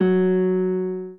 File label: spanish guitar